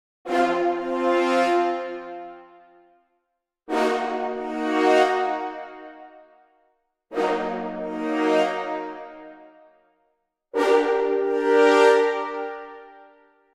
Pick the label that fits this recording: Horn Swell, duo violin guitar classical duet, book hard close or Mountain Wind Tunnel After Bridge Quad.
Horn Swell